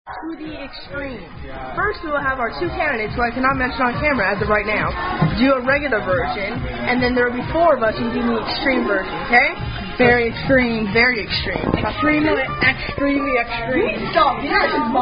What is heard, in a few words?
Hand Clapping Game